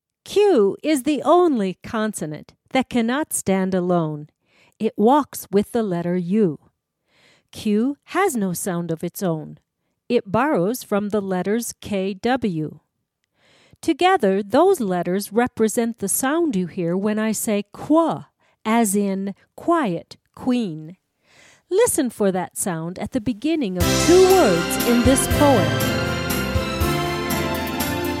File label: Wood Block